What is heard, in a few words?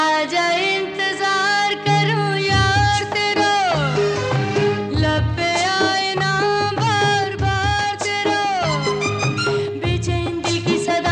Jingle Bell; mutthi bhar chawal